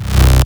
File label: Basses Root C